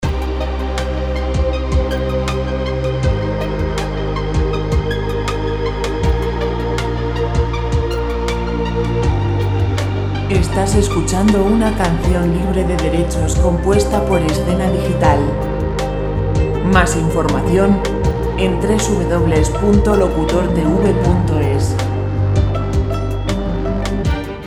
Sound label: clasic